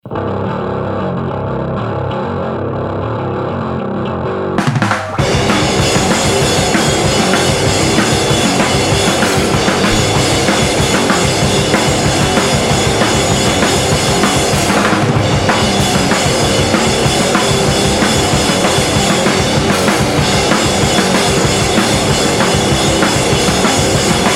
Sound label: Death Rattle